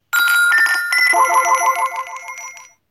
Windbell

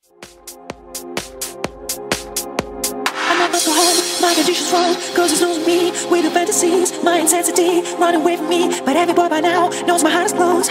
Ring; Flash Forward

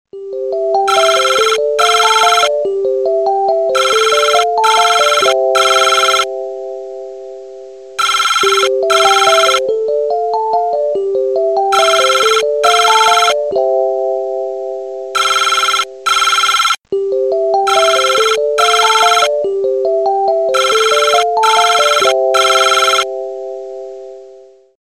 Business Ring iPhone

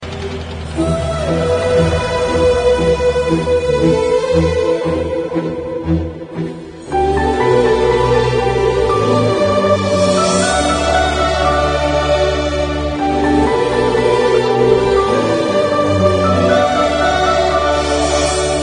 Romantic Music

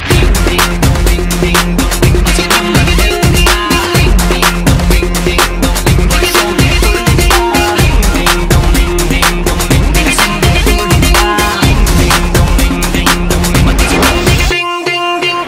Ring Ding

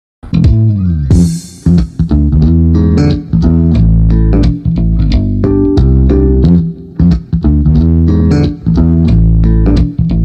bass licc

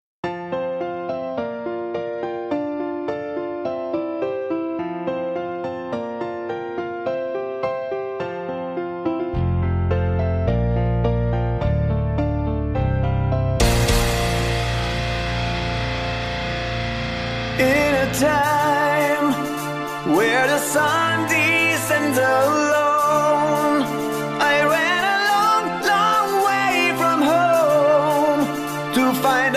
Metal cover

